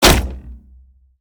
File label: bullet small crossbow bolt; gmod; viper